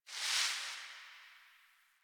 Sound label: Garbage Plastic Bag Rustle; Warframe; Ambience; Nineteen Ninety Nine; DE